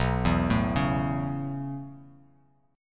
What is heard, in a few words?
B 7th Suspended 2nd Suspended 4th down, chord; B7sus2sus4; arpeggiated; JGuitar